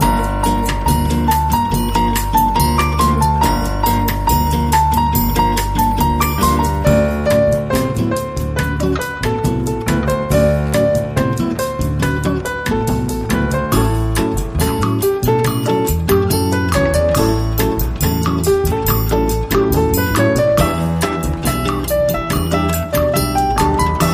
Bossa

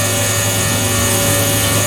Metal Jaw Movement Looped

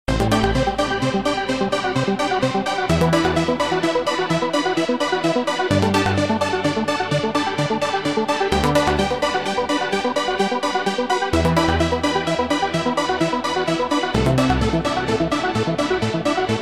epic ENDING; Why Pizza; PS4; Xbox Series X|S

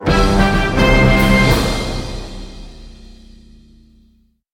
Big Orchestral Win Fanfare

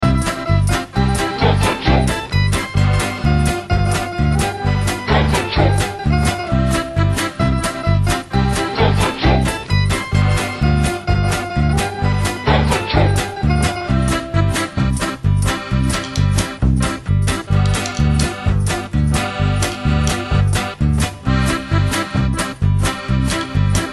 Finishing Move! (Dancing Folk); Hell Yeah! Wrath of the Dead Rabbit

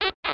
Squeegee

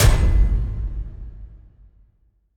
Slammed Big Metal Door

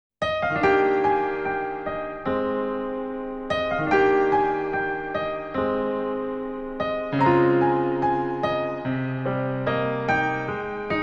Image Piano; Memories Off 6 T-Wave

